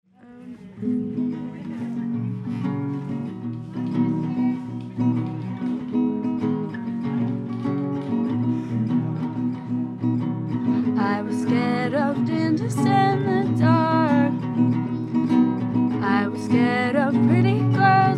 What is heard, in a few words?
Singing Words